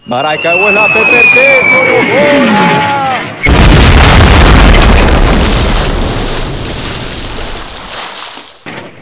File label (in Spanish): bomba; ze mcbo bridge; cstrike